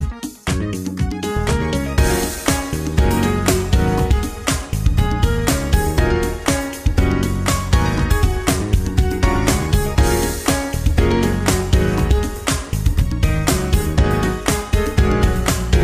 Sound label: dance jazz loop; ringtones